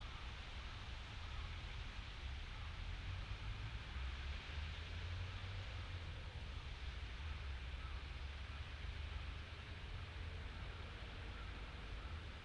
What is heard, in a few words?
yamanakako sound